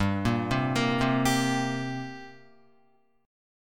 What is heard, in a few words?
G-Minor Double Flat 5th G down Guitar; arpeggiated; JGuitar